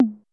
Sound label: TOM, SY99 KIT; Crypton - DrumBase5000